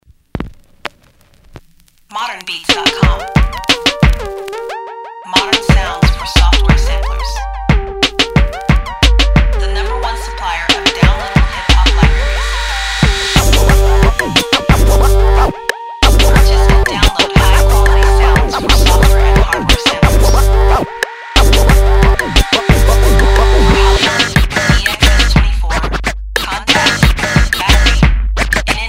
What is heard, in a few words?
Vinyl Scratchez